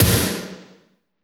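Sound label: thrash snare; Drums In Your FACE!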